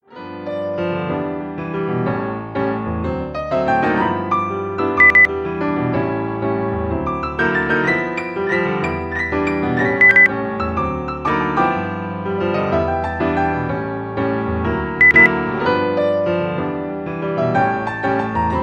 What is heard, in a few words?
jazz beep